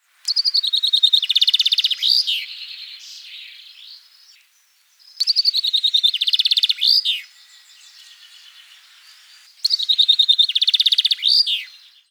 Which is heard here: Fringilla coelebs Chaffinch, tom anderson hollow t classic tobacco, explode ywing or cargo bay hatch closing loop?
Fringilla coelebs Chaffinch